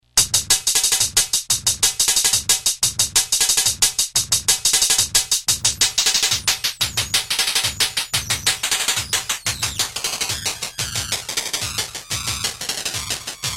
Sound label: drums